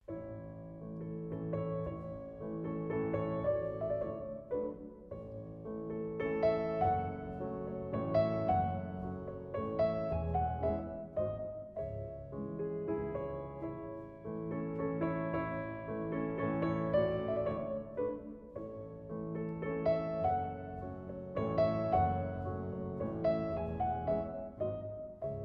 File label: Waltz in A Flat Major